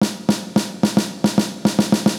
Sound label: break